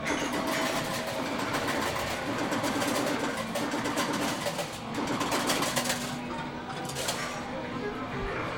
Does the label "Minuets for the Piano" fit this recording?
No